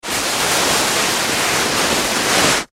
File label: Snowboard Down Hill Slope Glide Board; Skiing And Snowboarding